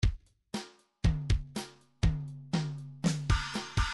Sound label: midi file grooves rock; Rock A